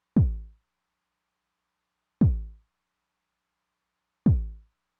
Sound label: kick drum w accent, Roland TR-909; Roland TR-909 Ableton Project